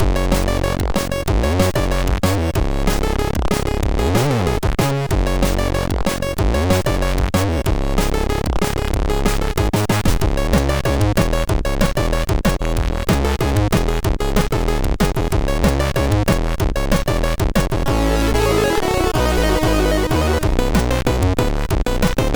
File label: Bubble Wobble Intro, MOS6581R3